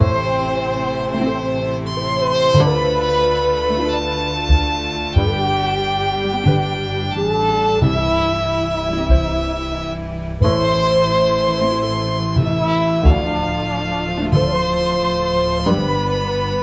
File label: gameover; defendin depenguin